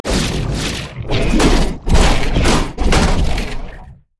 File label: emote laugh; Vel'Koz